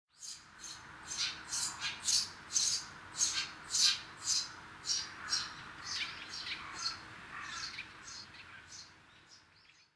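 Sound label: Eurasian tree sparrow, sapporo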